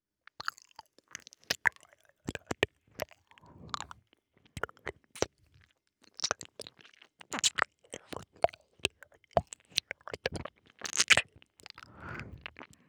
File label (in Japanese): お狐ちゃんの耳なめ